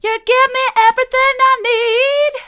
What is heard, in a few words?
mid, vocal